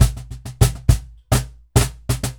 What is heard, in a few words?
Cajon Loop 100BPM; musicradar